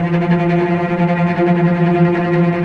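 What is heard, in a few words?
cello F3 tremolo; East West; The Ultimate String Collection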